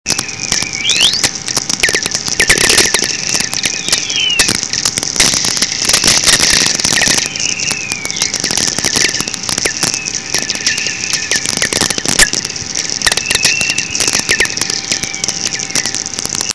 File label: Riser